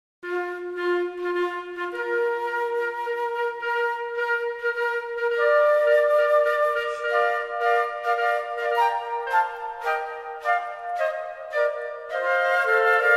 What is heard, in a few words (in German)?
Hirten Menuett für 3 Flöten C